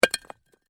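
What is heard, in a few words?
Brick Impact Or Cinder Drop; Stone slab, brick or cynder drop to the ground and settle; Rock Sound Effects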